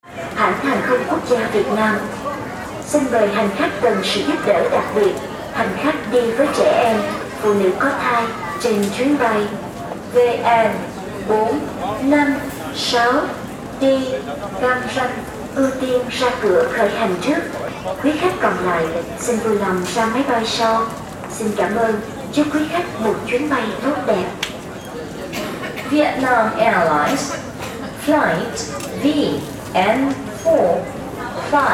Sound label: airport asia